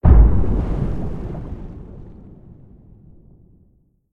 explosion underwater blast; sonniss gdc

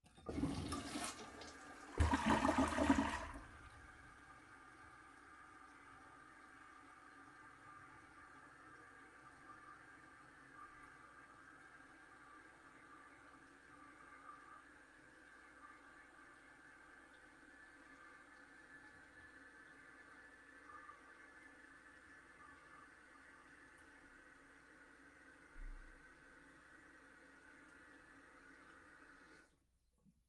toilet flush long; sonniss gdc

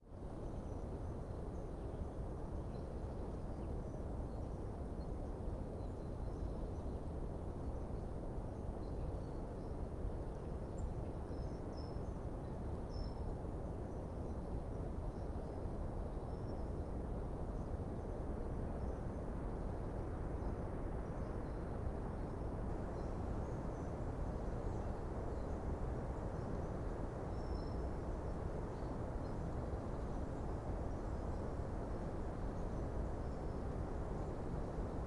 wind dead leaves whoosh forest birds; sonniss gdc

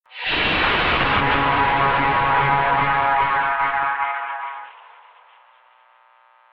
stinger horror bad radio; markthechef; Sonniss GDC

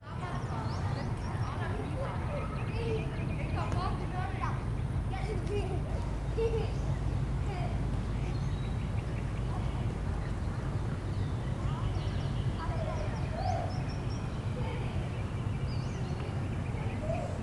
park residential birds children playing wind; sonniss gdc

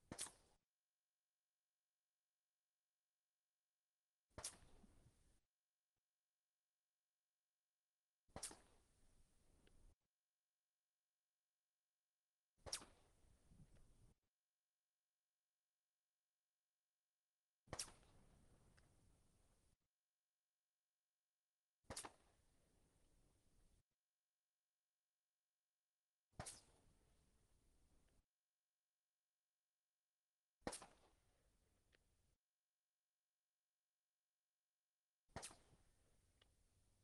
mp 40 firing 250m; holophone; fvtt sonniss gdc audio

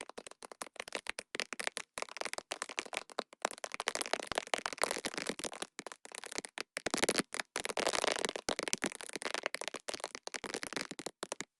designed drippy on tarp foliage; sonniss gdc

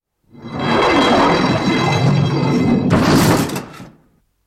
portcullis

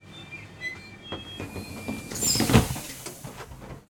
train door; fvtt sonniss gdc audio

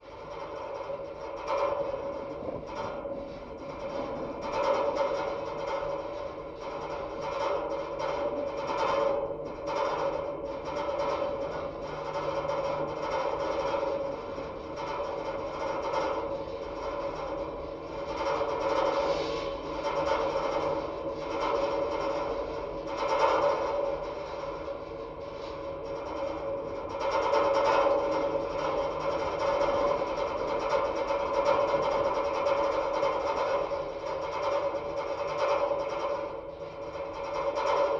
overhead power line in wind; sonniss gdc

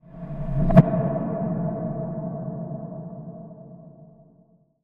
transition; sonniss gdc

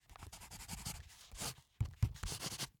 writing with pencil; fvtt sonniss gdc audio

